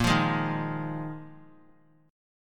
Bb 7th Suspended 4th Bb 3C,3F,3A,3D; strummed; JGuitar

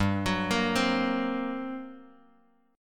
G Minor Major 11th, Bass Standard; GmM11 arpeggiated; JGuitar

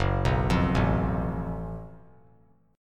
Gb Minor Major 7th Double Flat 5th, chord; arpeggiated; 6,6,8,7; JGuitar

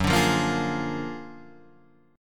Gb Augmented Major 9th; Gb+M9 strummed; JGuitar

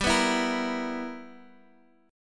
B Major 7th Suspended 4th Sharp 5th G

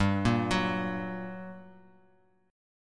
G Minor Major 7th, down; GmM7 arpeggiated; JGuitar

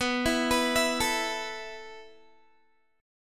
B 7th Suspended 4th B, Guitar; B7sus4; arpeggiated; 19,19,21,21,21,x; 4E,4A,5D,5G,5C,6F

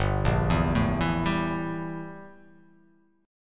G Minor 6th Add 9th G down

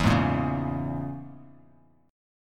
E 6th Flat 5th Bass Standard, E6b5 chord; strummed; JGuitar

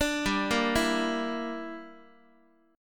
G Minor 6th, Guitar; Gm6 arpeggiated; JGuitar

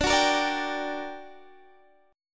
G Minor Major 7th, D, guitar chord

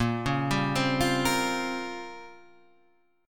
A sharp Minor 6th Add 9th A sharp, Guitar chord; A#m6add9 Chord {6 4 5 5 6 6} arpeggiated

